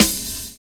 BIG ROOM; Univers Sons - Kitch 70s

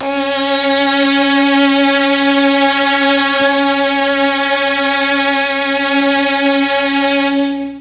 C#2 3 violins, Mellotron MKII; Mike Pinder - Mellotron Archive CD

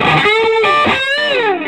SOLO, Vintage Blues Guitar

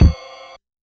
Rhythm Kick + Ride, Realistic Concertmate-670; Layered Kit

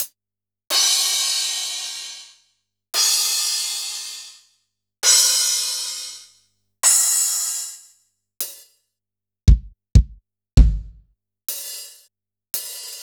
Kurzweil Kit

